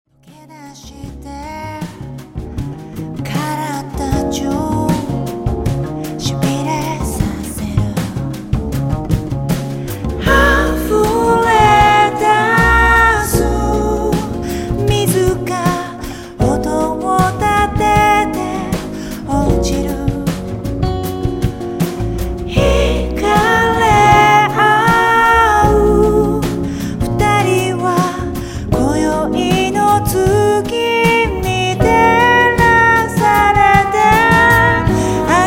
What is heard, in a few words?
music